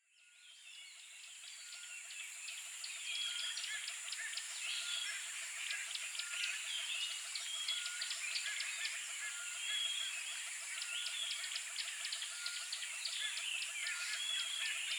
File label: Soft Distant Birdsong; morning birdsong